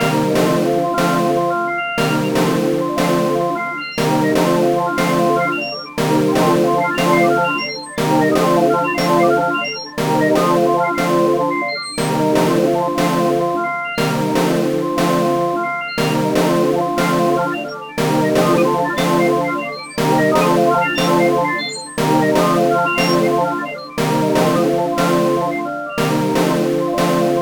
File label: Panharmonium Feedback Melody Blur LFO; Rossum Electro-Music